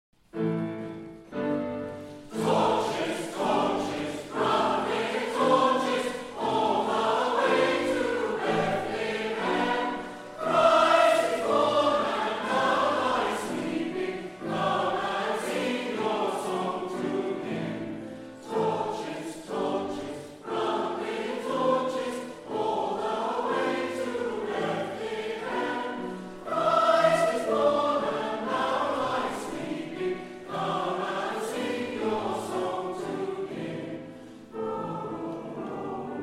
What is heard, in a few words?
Torches, Village Voices